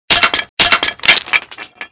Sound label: tile